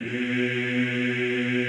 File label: EEH, Classical Choir